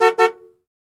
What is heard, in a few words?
Jeep Cherokee Horn Honks, 2 Short